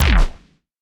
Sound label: main shot